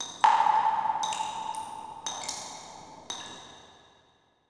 PIPES, pipes; zones

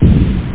RAP Stampf; Instruments

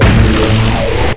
orb hurt; Hexen II; eidolon

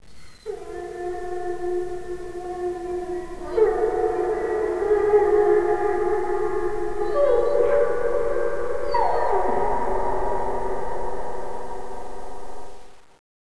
DOG WINE

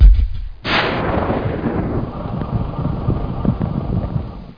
MISSLE

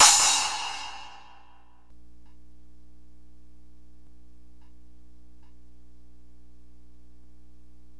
crash, Drums

